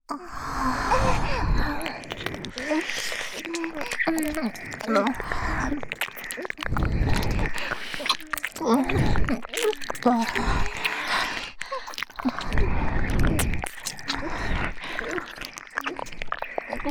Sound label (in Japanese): 左右同時喘ぎ耳舐め×フェラチオ